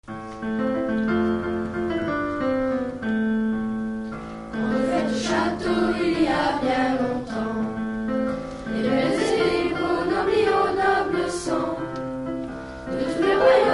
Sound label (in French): Violons; Classes de 5ème